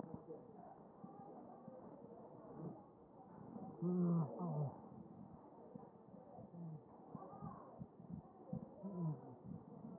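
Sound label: anterior chest, position 1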